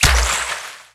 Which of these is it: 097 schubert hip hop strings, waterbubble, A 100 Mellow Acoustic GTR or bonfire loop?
waterbubble